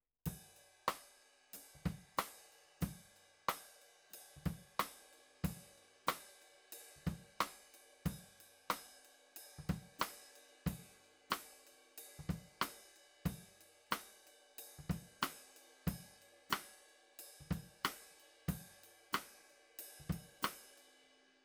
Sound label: ROOM mono